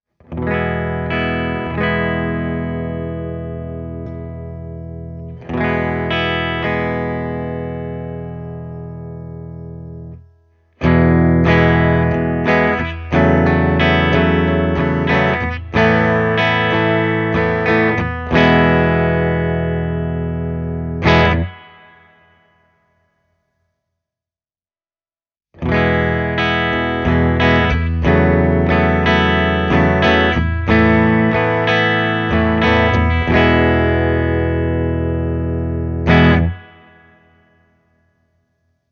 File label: brunetti singleman 16 les paul lo vol 10 oclock preamp modes; The guitar is plugged into Lo, with the Volume control still at 10 o’clock; Bright-switch from ”off” to ”on”